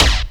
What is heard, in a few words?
KICK, Hip Hop; PlatinumPhattCD1; E-MU Producer Series Vol. 8 – Platinum Phatt